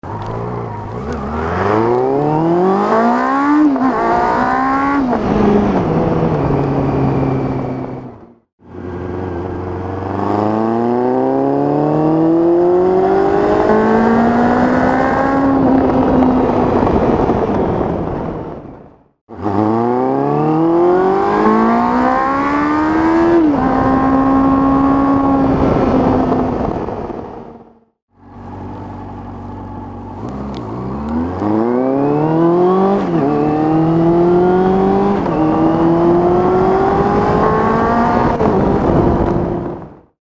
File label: acceleration, cb400sf